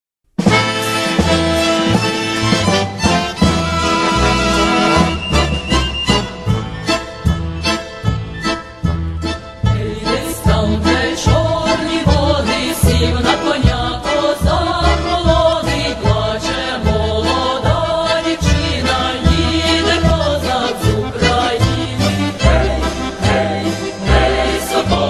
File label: Ukrainian folk song; Гей, соколи! (Hey, sokoly!)